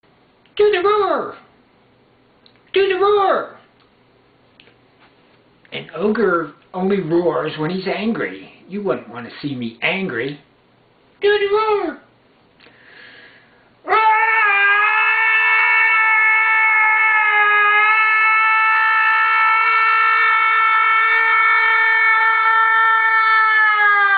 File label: Roar